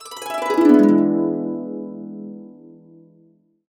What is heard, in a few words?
E, Harps; USB Soundscan vol.62 - Complete Harps; AKAI